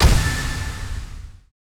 Victory Core Bling

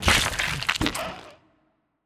Big Death Gore; Big Death Gore3

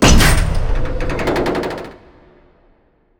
Door

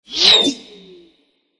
Tennis

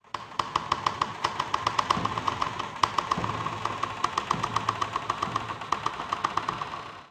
Hat Creaks

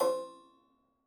candle clang; Tabletop Simulator

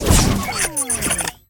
Drone; rabbids multiverse; iOS, macOS, Mobile

